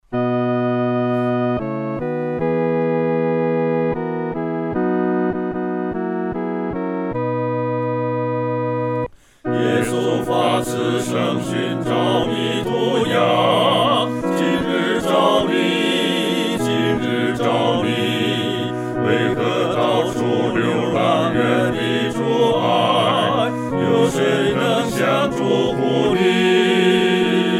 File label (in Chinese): 合唱 四声部